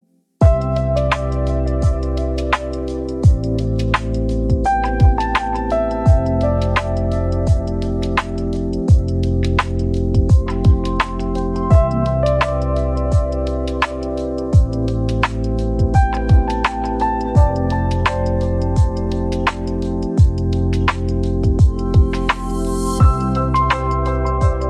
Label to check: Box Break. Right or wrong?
Wrong